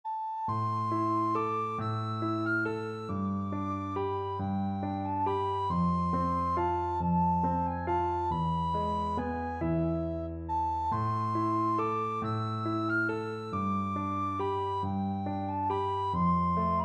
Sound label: recorder